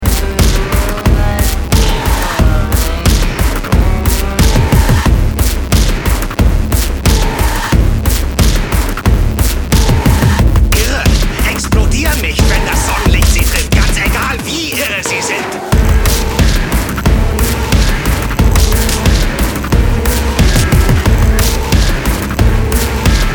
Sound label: sindustrial; Neo; Irreexplodierennicht